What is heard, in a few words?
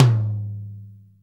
hi tom sample g sharp key